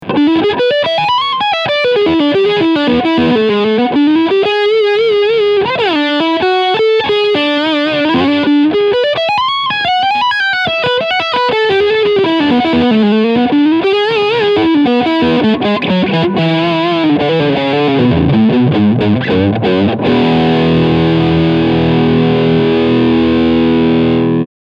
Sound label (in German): Leadsound Steg PU